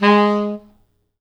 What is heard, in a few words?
G#2, Pop Brass; Best Service ProSamples vol.19; AKAI